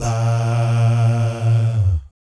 VIB FX, Vocal Planet Jazz & FX; ILIO; JAZZCOMBO

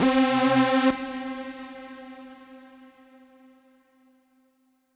Synth C, Synth Prophet One Shots; Electronisounds

